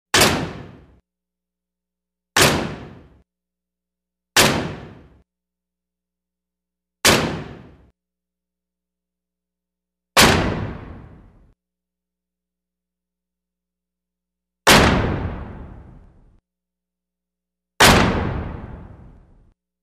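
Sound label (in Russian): дверь духовки закрывают хлопают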